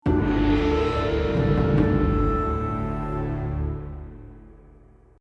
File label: New Spell Learned